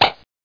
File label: pop